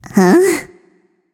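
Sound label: nonverbal confused; smite; voicepack; izanami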